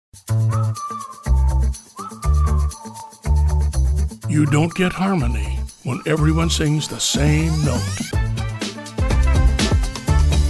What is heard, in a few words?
Harmony